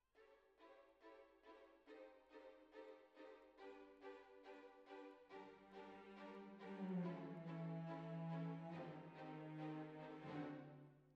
Clarinet

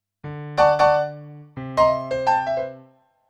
PIANO, RNR for piano

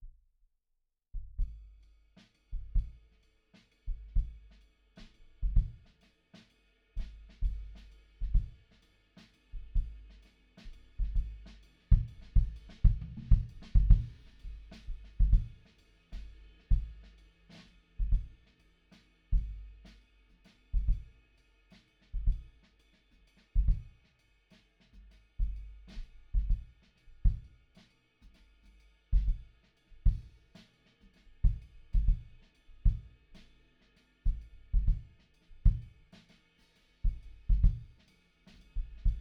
Kick In

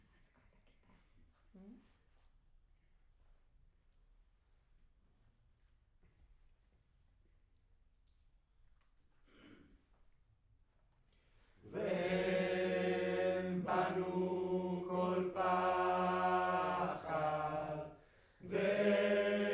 Room, Choir

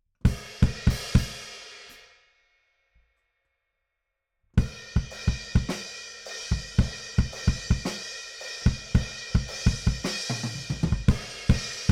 BD Out

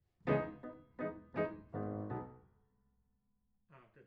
Piano A

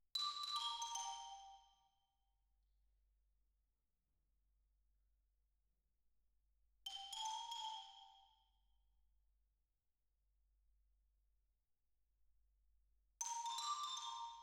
Xylo

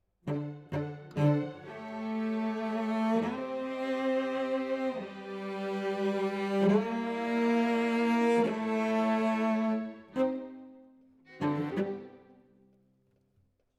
Cello Solo

